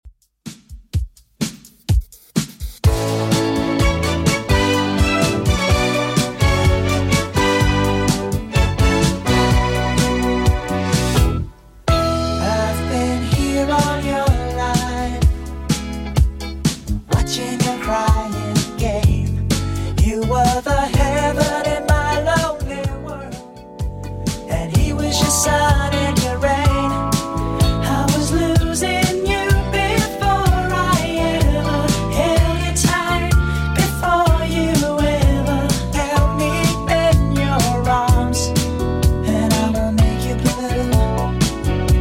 70s Redrum 126 bpm CLEAN

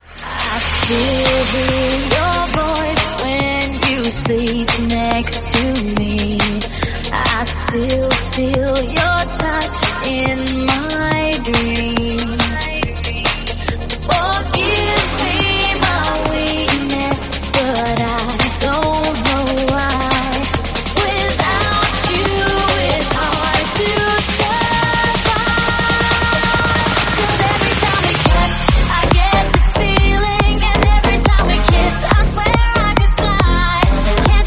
140bpm Fm

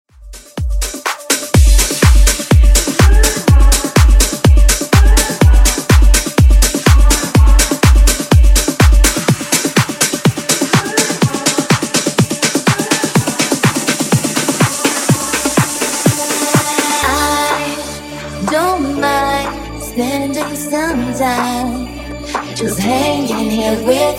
Funk 124 Bpm

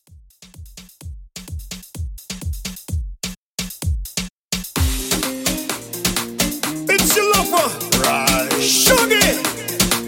Reggae Rton Redrum 128 Bpm Clean

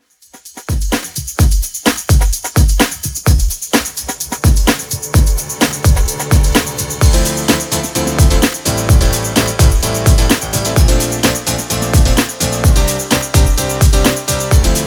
128Bpm

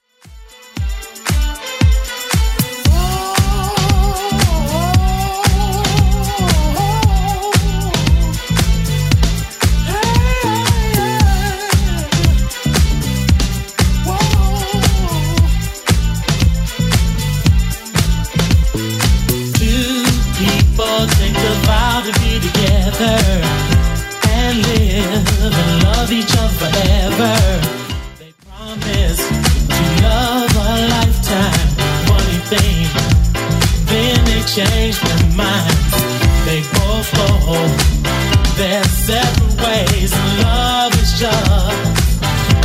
80s Club 115 bpm

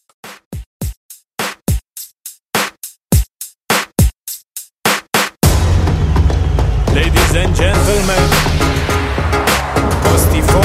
Moombaton Re-Drum 104 BPM

